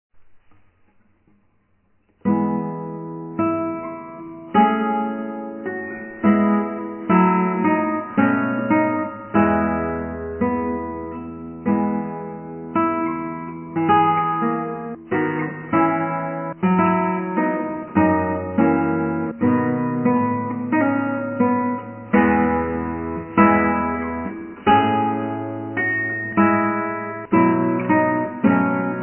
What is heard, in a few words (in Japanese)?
ギター; ソルのエチュード31－23。アルカンヘルで